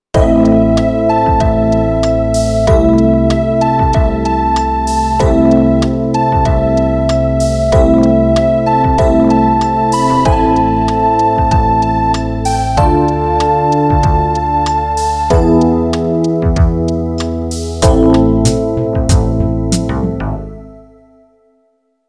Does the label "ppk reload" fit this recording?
No